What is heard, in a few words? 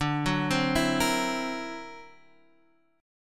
D Augmented 9th, Guitar, Half Step Down